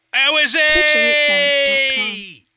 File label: Aussie Cheer